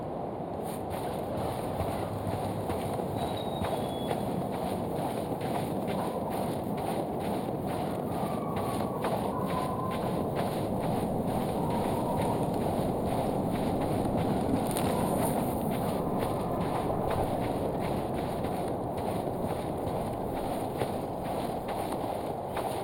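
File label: atmosphere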